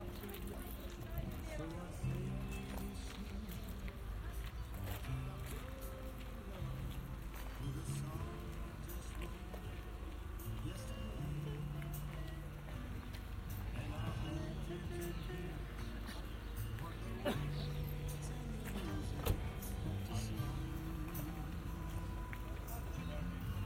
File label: car boot soundscape